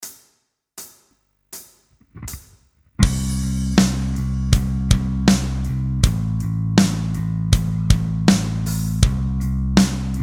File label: Backing Track; acoustic guitar